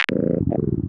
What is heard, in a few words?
combine button; buttons